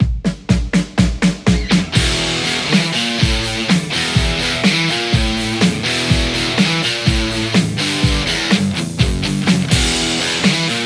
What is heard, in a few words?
strat; poulin lecto